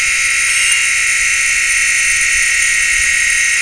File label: grind